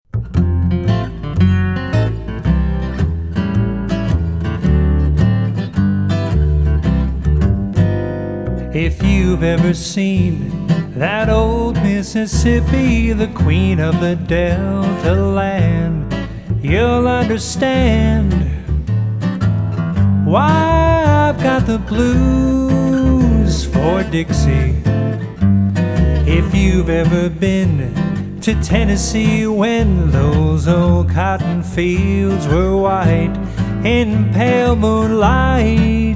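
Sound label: blues guitar duo; dixie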